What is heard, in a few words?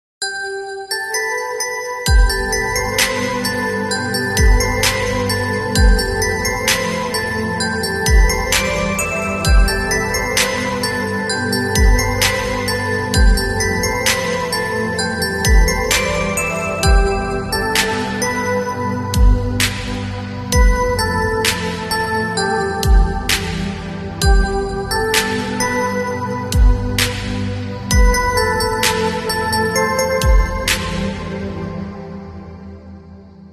Mobile Ringtone